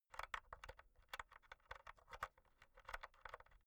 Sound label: insert usb b rattle, elektronik; objekte